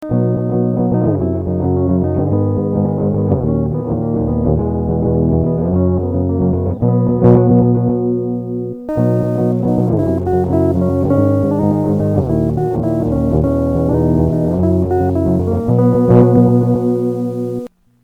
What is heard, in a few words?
music